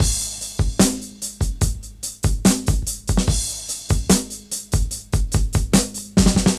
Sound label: 73 bpm breakbeat g sharp key, Free drum loop - kick tuned to the G# note. Loudest frequency: 2709Hz